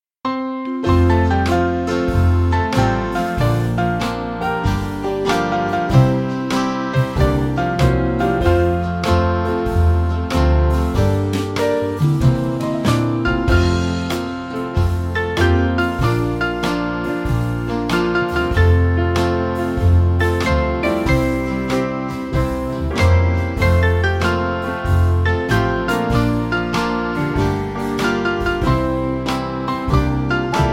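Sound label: Band, Music Backing Files for Churches; Spiritual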